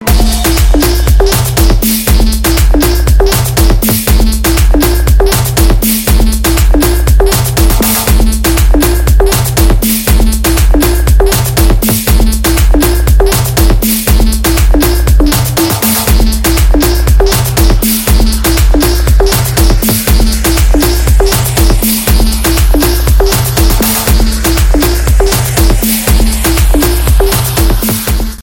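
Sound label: indonesia romantic ringtone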